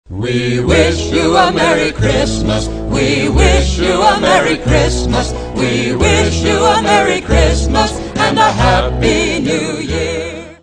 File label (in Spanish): navidad cancion